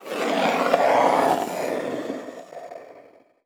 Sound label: Hard Slide Long